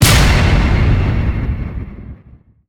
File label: Ui Ingame Countdown Over; gamerip